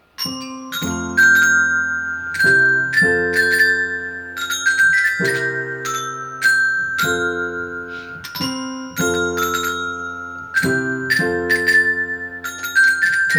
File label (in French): sonnerie; mon beau sapin